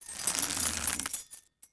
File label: chandelier Swing; objects; Star Wars Obi-Wan; XBOX